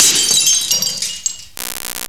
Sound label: glass; Rubber Chicken Software Sample Bank; OLD SYNTHS; OTHER OLD